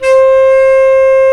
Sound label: WND BS CLAR, Bs Clarinet, Orchestral Winds; Roland LCDP04 Orchestral Winds; Lo Clarinets